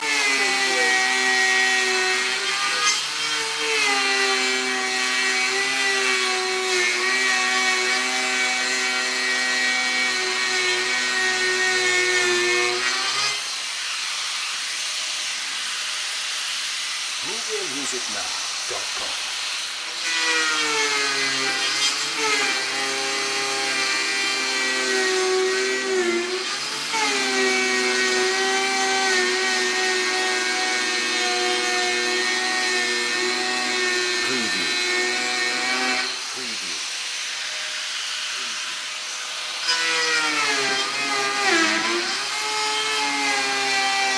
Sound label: circular saw loop